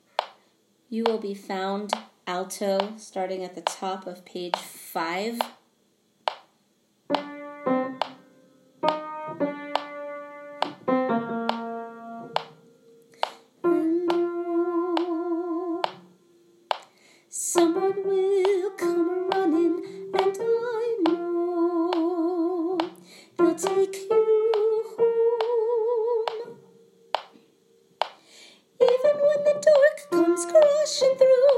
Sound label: alto; Broadway Shabbat